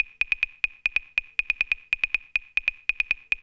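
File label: PERCUSSION ELECTRO 140, Techno Drum; Techno2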